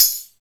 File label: DM5 Perc, Alesis DM5 drum machine